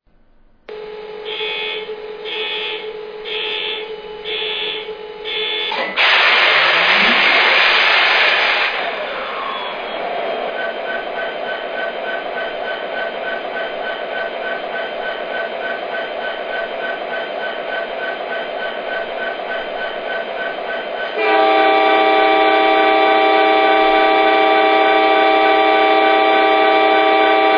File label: SD70ACe